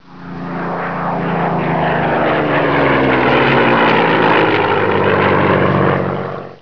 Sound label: Spit twinseater; Spitfire; MKS Fighters